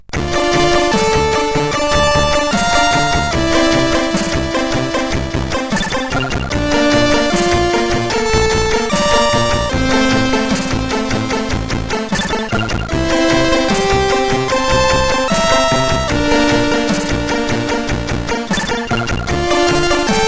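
Operation Omega Blast, MOS 8580 SID chip music, games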